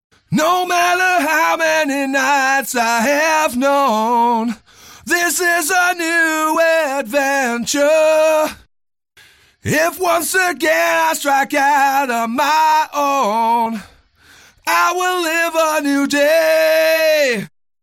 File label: lead solo